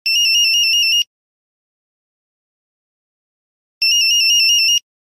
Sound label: Mosquito